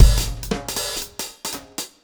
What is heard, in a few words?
Shuffle Beat